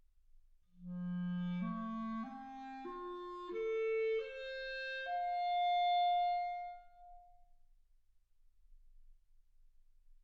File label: clarinet